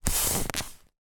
toy inflatable figure squeeze air rush rubber squeak